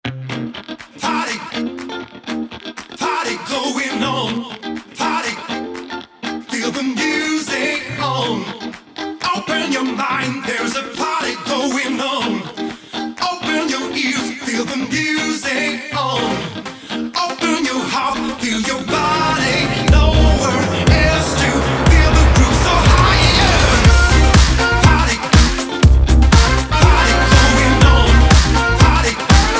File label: Swingin' In Da Rain